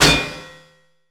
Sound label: metal get; Fate Undiscovered Realms; ITEMS